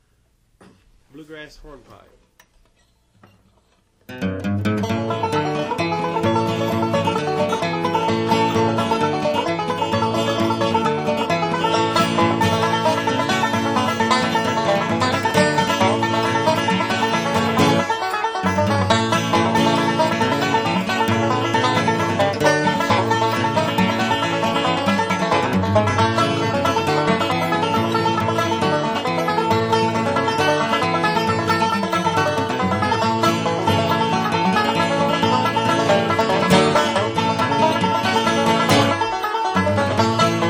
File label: Bluegrass Hornpipe